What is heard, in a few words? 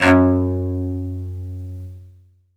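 solo cello, strings; ILIO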